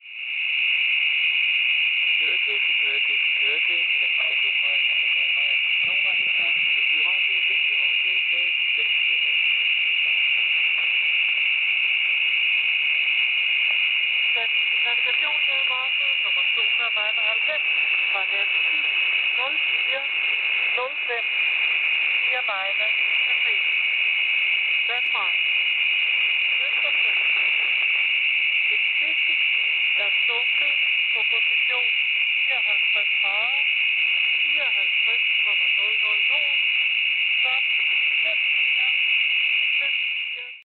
2586 kHz; Lyngby Radio

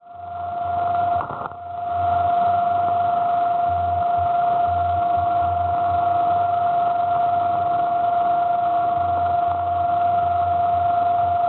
NDB 338 kHz; OA; Jonkoping; Svezia; Schwarzsee

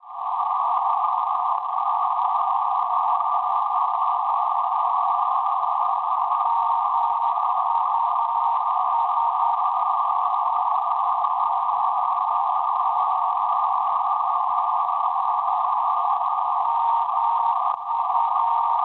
NDB 428 kHz; MUS; Nizza, Francia; Schwarzsee

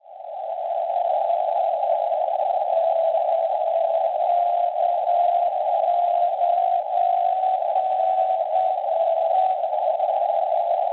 NDB, 438 kHz; PE; Poprad; Slovacchia; Schwarzsee

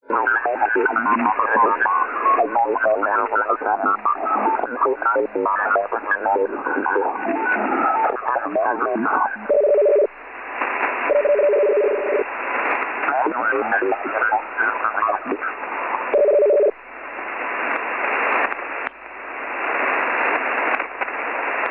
scrambled 6636 kHz USB; TFC; FTDX5000; Schwarzsee

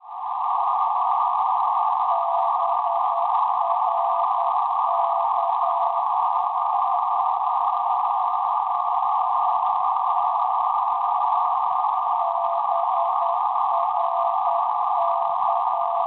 NDB 408 kHz; BRK; Bruck, Austria; Schwarzsee

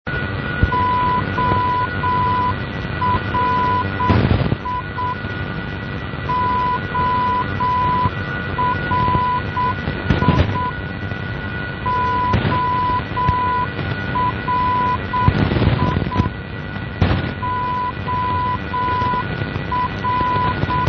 ORI NDB

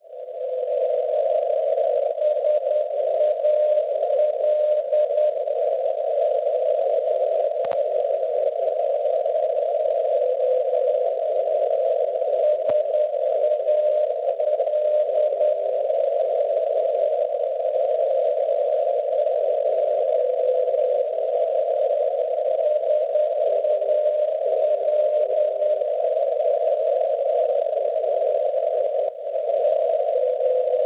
NDB 418 kHz; SAL; Sainte Leocadie; Francia; Schwarzsee

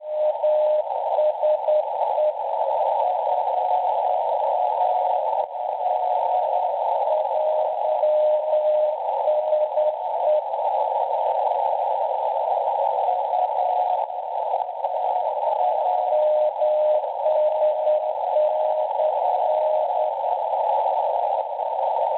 NDB 357.5 kHz; FAL; Falconara Marittima; Italia; Schwarzsee

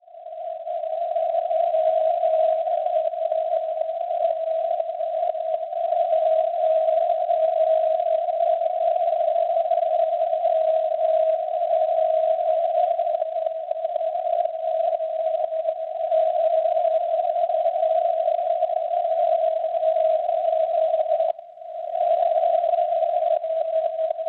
NDB Maribor Slovenia 334 kHz; MR; Schwarzsee

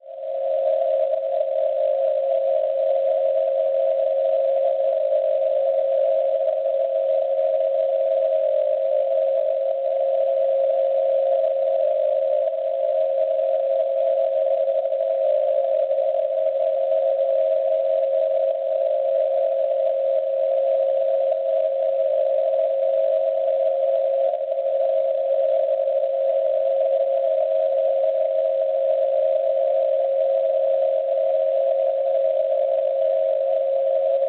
NDB Pruszcz Gdanski Polonia 300 kHz; NE; Schwarzsee

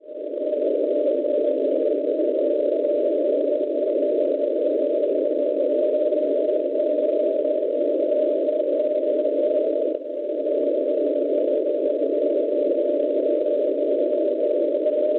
NDB Chambery Francia 346 kHz; Schwarzsee

